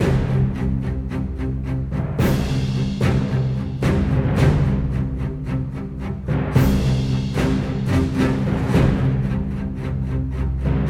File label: sounds music; Halo Combat Evolved; Xbox